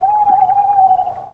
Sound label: OWL START